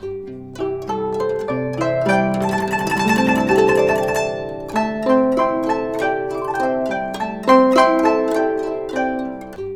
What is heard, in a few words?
GUCHIN 100 C; INIS - Opium